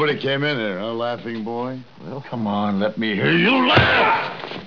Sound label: laugh; Western Movie Wavs